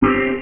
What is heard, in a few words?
Bwee; Realmz 6.0.3; The Family Jewels